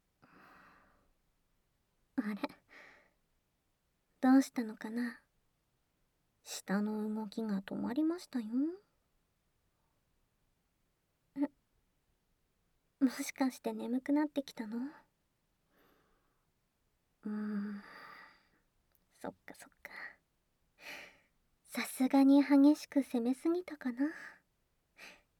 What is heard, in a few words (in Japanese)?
添い寝, ASMR